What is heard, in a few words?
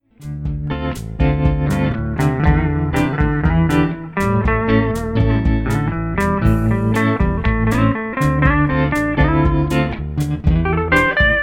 guitar song